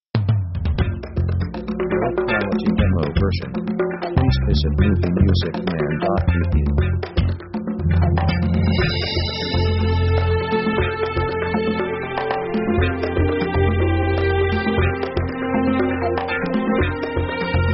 pop cheer up; upbeat themes

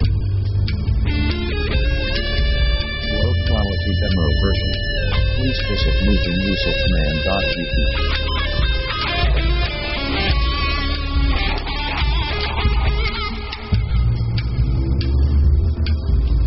guitar noir; Dark mood

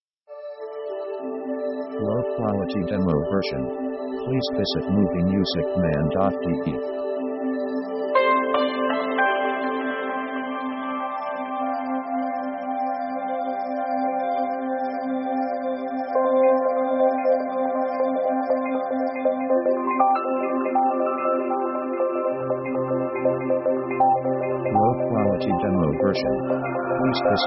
light background; synth themes

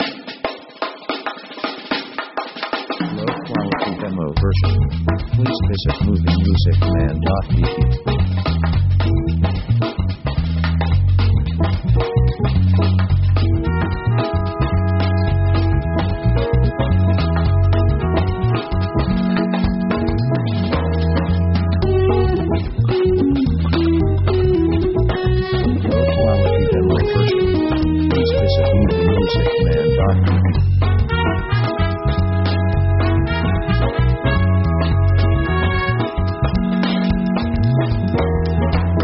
pop new orleans easy

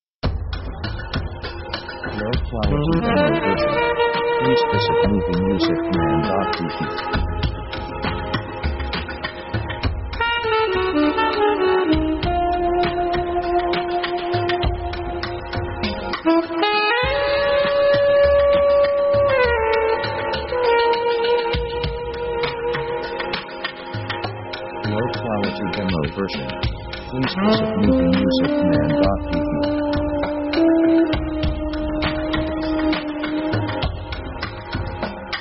strange encounter; synth themes

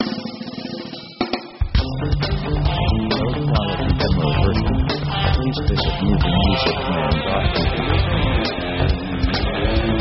pop 135bpm Funkrock

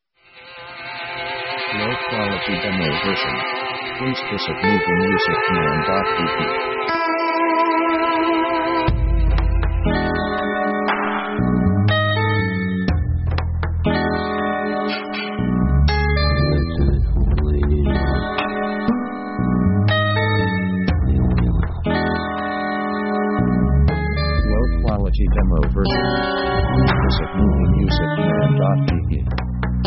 Strange Guit; strange themes

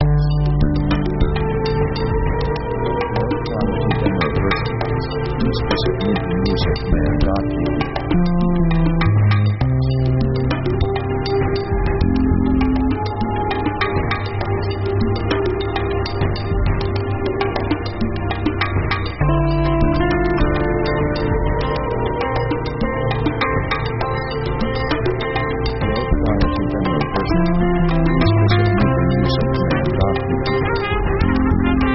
fusion space, synth

